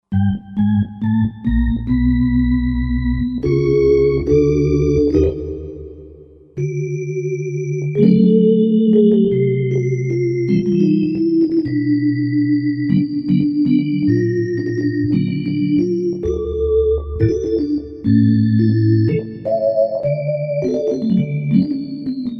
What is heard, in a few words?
Roland JV-2080; Soap Opera